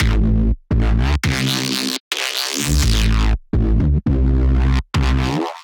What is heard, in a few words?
A Minor 170 bpm, Bassline; MIDI Clips; Tonal; Rinsin Gritt; Skitter and Step; Ableton Factory Packs